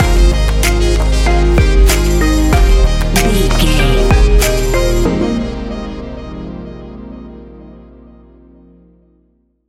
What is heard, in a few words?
A fast paced piece of club music; A fast paced piece of club music perfect for the nightclub, and a youth orientated visual scene.